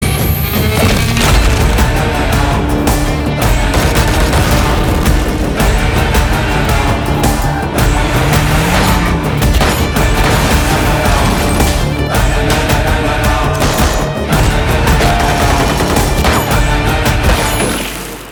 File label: Trailer BGM; Vikram Vedha